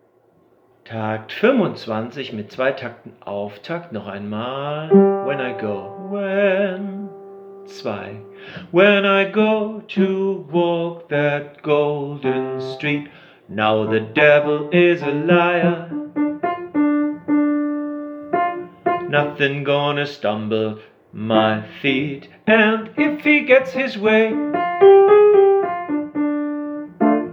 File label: Bass